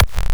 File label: Silver BBD Kick, drum machine; 300 Drum Machines; DevSnd